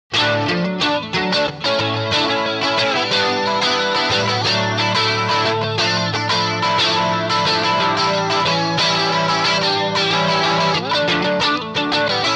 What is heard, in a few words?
MAXON CS550 clean chorus